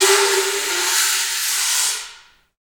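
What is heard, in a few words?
wind bull, Africa, Afro horns, bullhorn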